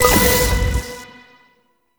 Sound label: Hack Minigame Click After Hold B